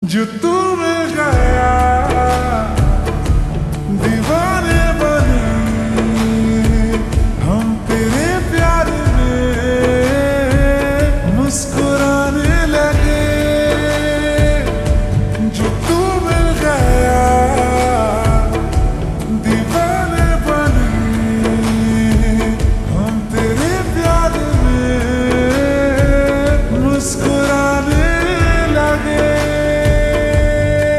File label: Ringtone Slowed Reverb